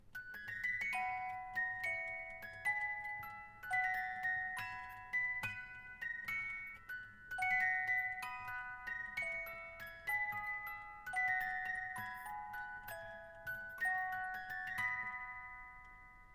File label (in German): Blumenwalzer